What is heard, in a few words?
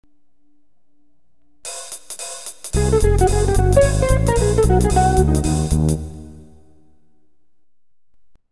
jazz